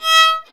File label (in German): GEIGE; Best Service; Alpine Volksmusik